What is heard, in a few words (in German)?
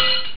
metallblock; Amiga Soundtracker sample